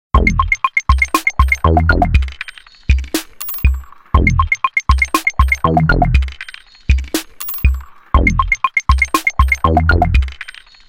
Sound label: Machines, Nokia Tones; Windows Phone apps